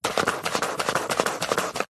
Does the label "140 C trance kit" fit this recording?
No